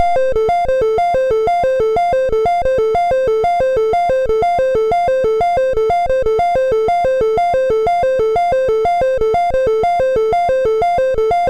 sid, MOS6581R2; Donkey Kong 1983

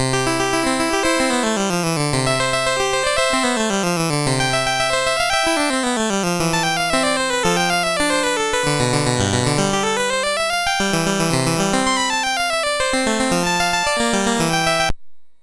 Prelude, MOS6581R4; Croft

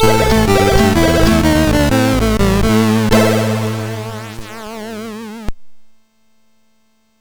sid MOS6581R4

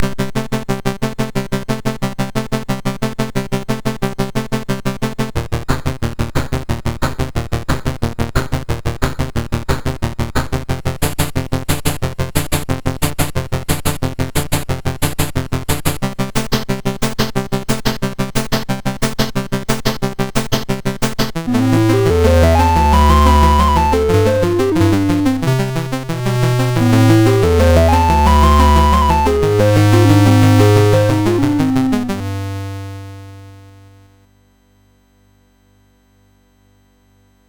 sid, MOS6581R4; Fleet Feet

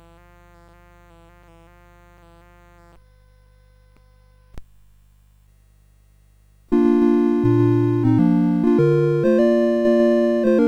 CSG8580; CSG8580R5

